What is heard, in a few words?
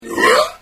hwoah, farts